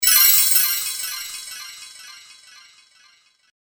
Dark Elf Magic Spell; Magic Sound Effects; fantasy sound effect; Andrew Fleming

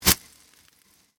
Convolution Surge; Sound elements to create more complex sound effects; Swell Sound Effects; Swells (Sound Effects:Sound Design)

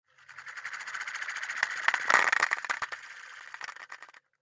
Toy Spinner, Foley Spin; Miscellaneous Toy Sound Effects; realistic

